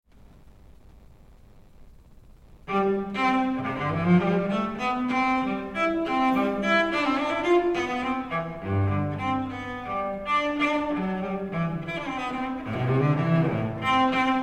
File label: Solo Cello, C Major, Gigue, LIVE; JS Bach; Suite for Solo Cello No3; Mimi Furuya